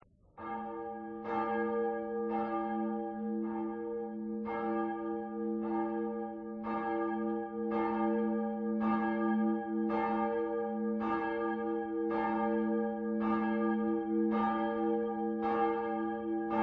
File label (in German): GELAEUTE